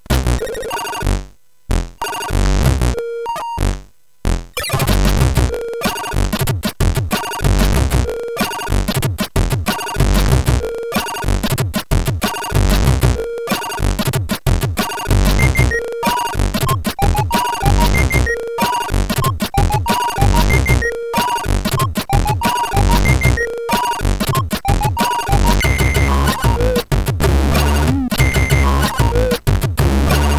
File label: HipHop, CSG8580R5; hvsc